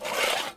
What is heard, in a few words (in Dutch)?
schuif, Home-made Thuis